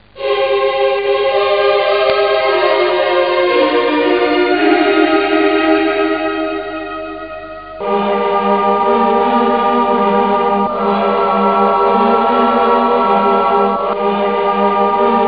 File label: Church Loop; The Town with No Name; Amiga